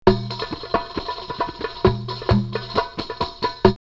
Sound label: Basra 134, drumloops World Ethnic; X-Static Goldmine